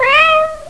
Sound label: meow